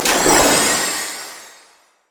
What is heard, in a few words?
surging tides; LoL; nami